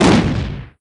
explosion mouse; Swift Cats

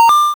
flagged; BlockPile

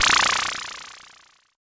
boss move; Season Land

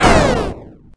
sfx explosion; Space Shooter Alien

